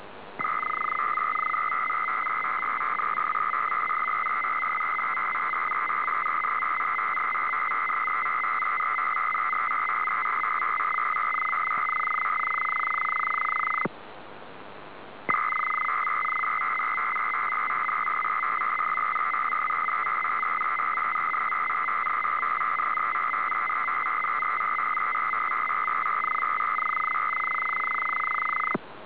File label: GERMAN AF 300.4 Bd 11-BIT TELEMETRY